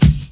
puffy top end bass drum sound c sharp key

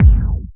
strong bottom end steel kick drum sound g key

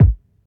bass drum one shot a key; kick

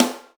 nice acoustic snare sound b key

